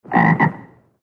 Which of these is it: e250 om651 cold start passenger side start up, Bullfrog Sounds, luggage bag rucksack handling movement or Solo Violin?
Bullfrog Sounds